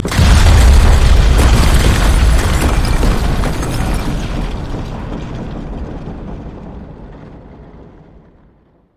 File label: cutaway break; LoL; emitters map12